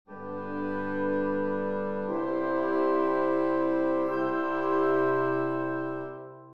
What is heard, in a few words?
woodwind threes; Nikolai Rimsky Korsakov